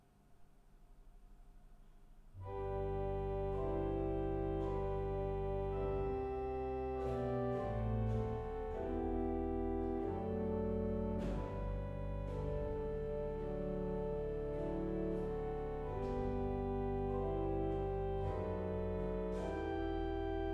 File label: elevation, orgels; kolderveen; Rooij